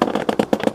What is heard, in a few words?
plastic box strain; physics; Source Engine; cstrike